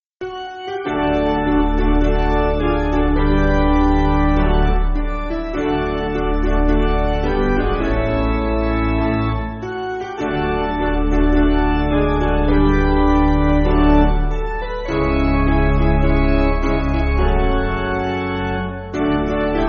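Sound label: Piano, church backing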